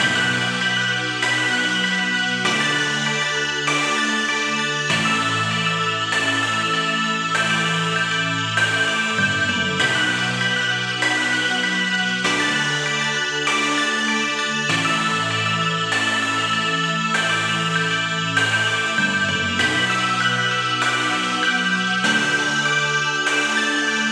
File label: Loop; Slay or Fall; Room game; Deal Captor